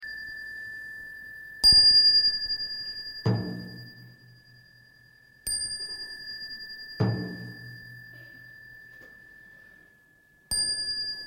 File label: chanting